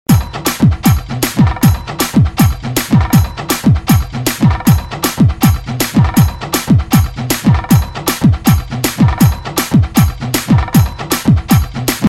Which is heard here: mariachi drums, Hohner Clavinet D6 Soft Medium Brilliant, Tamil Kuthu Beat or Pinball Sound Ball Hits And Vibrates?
Tamil Kuthu Beat